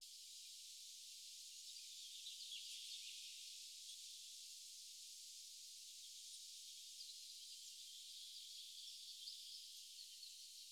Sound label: 128 BPM F Min Ambience; House; Loop Stems; Cymatics; Breakdown Loops; Sunrise